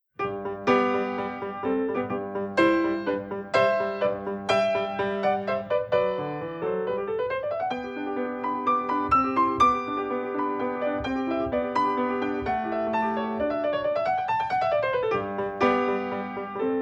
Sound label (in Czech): sonatina G dur; Jan Ladislav Dusík; Petra Bělohlávková